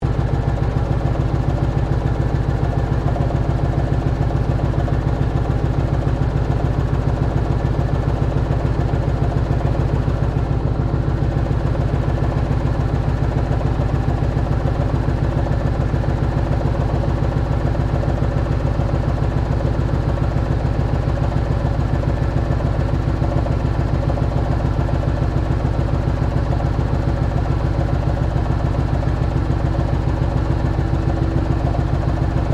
Tractor Lance Bulldog Model Q 1954 idle, Old Farm Tractor; Tractors; Vehicles